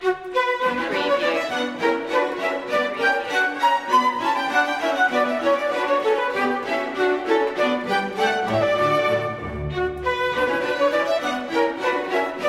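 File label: bach orchestral suite no2 b minor bourrees i ii; bwv 1067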